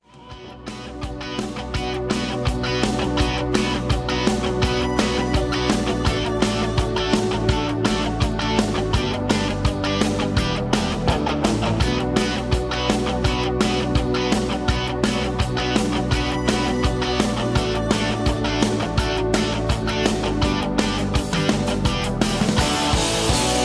Key B Minor

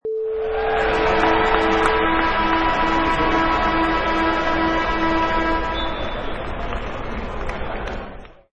Arena Crowd Noise

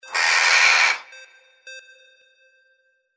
CROW

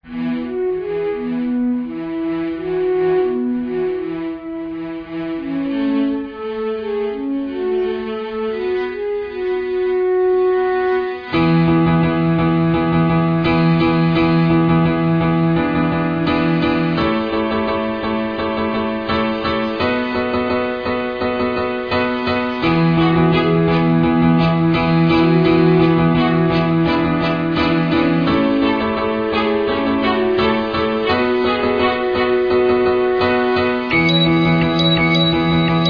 Happy Flute Strings

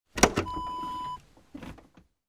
car trunk open

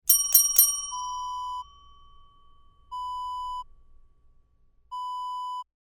desk bell

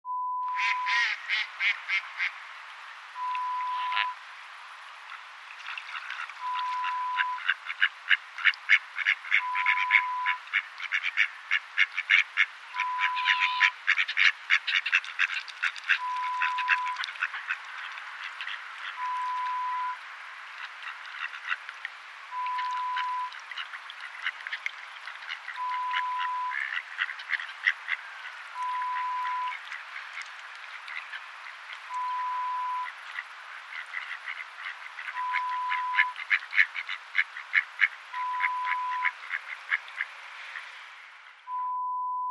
A Duck Pond sound

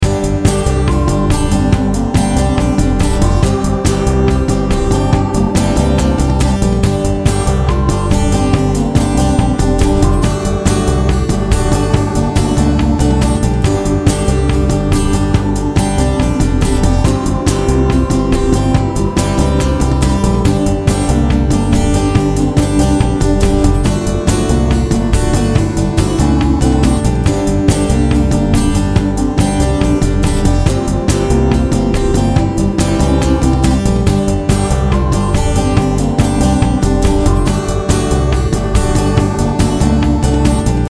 acoustic organ

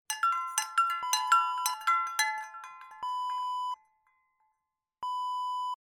cow bell ring

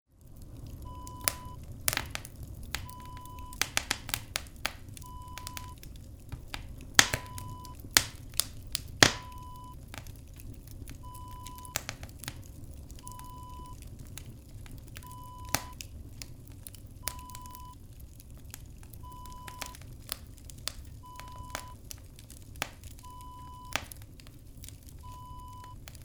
wood burning kitchen stove

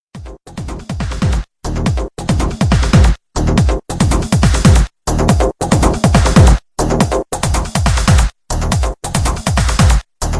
dance drums mixed

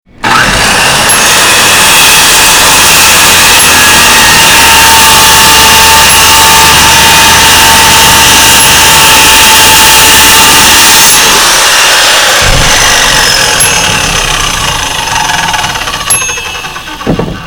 Saw

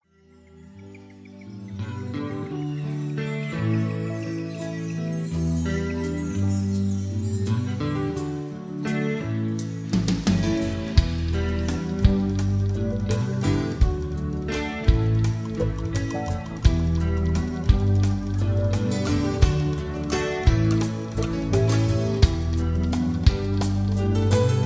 GENTLE BG

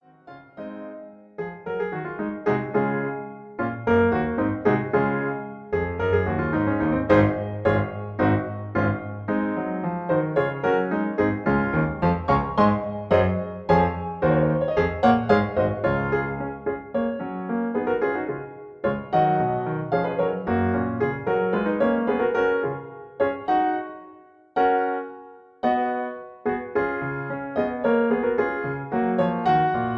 Accompaniment F sharp; Mio caro bene

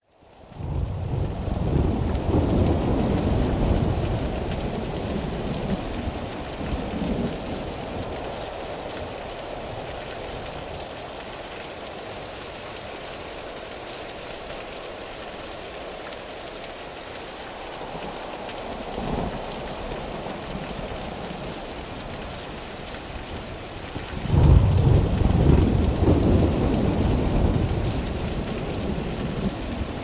Thunderstorm Sounds for Sleep Relaxation

